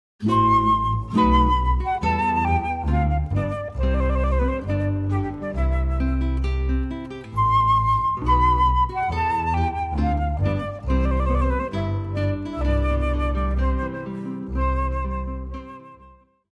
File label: Wedding March (Medelssohn), guitar flute bass trio